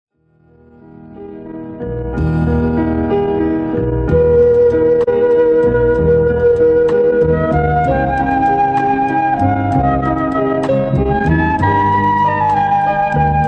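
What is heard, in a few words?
instrumental ensemble; zvuki staryih magnitnyih lent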